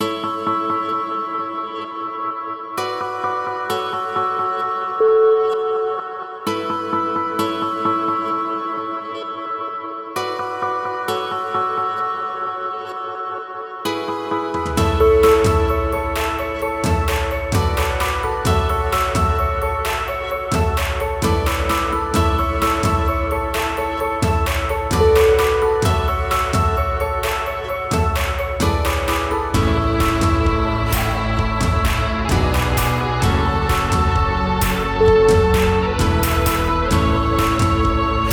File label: happy mood